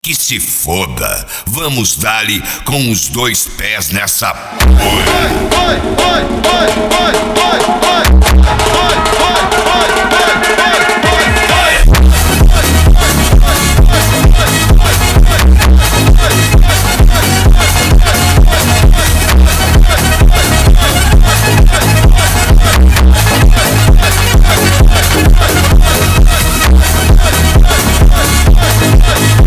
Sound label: lezginka funk sped up